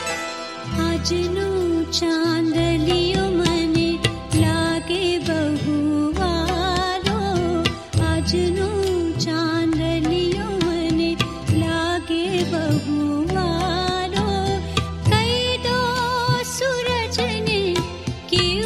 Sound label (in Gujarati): Garba Gujarati Song Ringtone